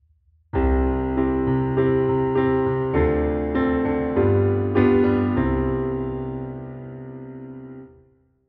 C Dm G7 C

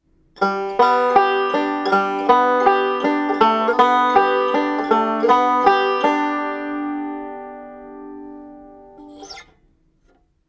Banjo add Slide

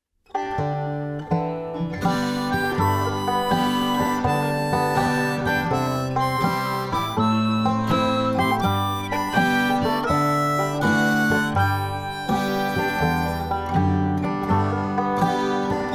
Quantize Bay Instrumental Deesser

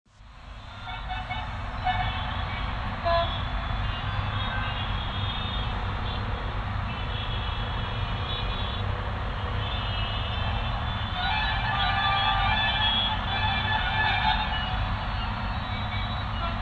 India Traffic

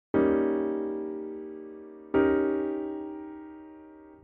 Cmin7 b9

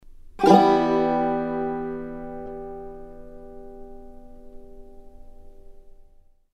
Banjo Em

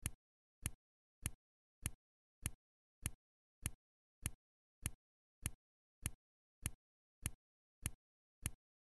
Click noise

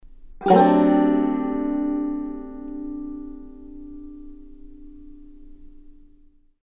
Banjo D7

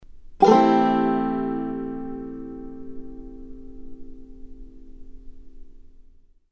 Banjo Am

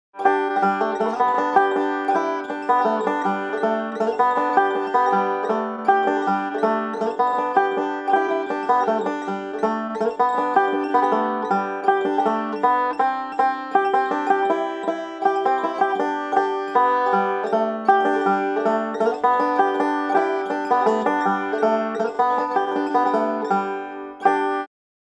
Banjo Solo add ARP

